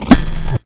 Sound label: ship hit border; Space Wave Race